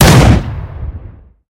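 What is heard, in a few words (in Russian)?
холостой одиночный выстрел из автомата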